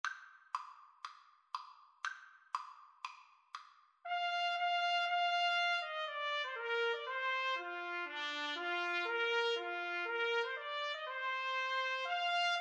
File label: trumpet cello, Trumpet-Cello Duet; clarke tpt tune